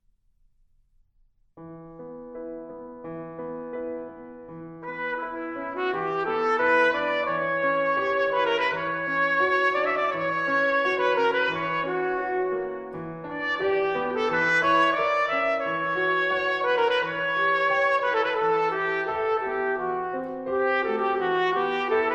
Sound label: Vocalise, Trumpet Vocalise